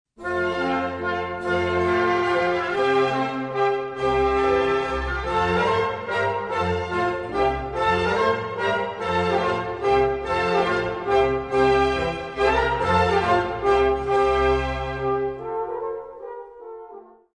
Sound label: Tempo di Minuetto (Processional; Handel